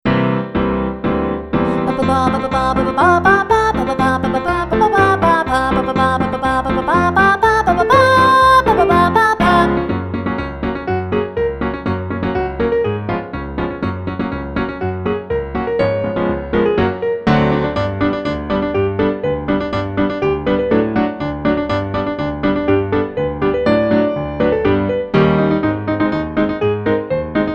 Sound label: Vocal Warm Ups Consonants; Vocal Warm Ups Volume 2; William Tell